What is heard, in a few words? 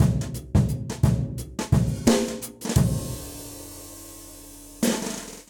Fill BrushSizzle 87 bpm, Session Drums; Session Drums Club; Ableton; Factory Packs